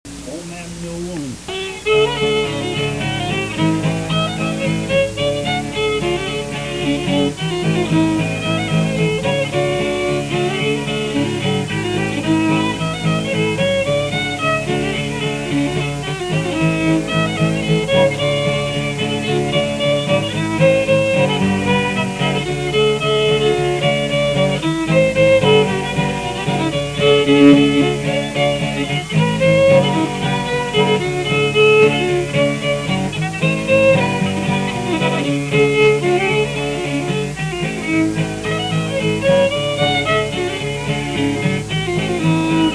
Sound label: Fiddle